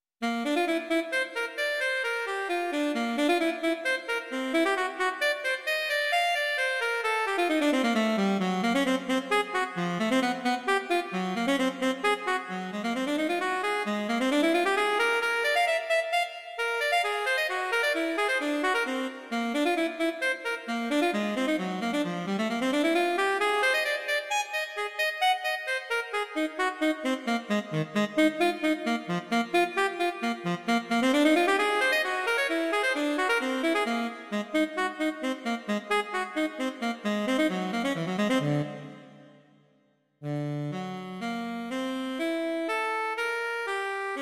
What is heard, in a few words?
allegro; intermezzi